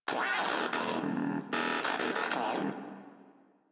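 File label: Moa Aggressive Vocal Interact Sit Forward; Warframe; Pets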